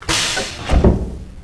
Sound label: Disconnected; Myst